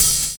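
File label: hi-hat; USB Soundscan vol.46; AKAI; CYMBALS; 70 s Breakbeats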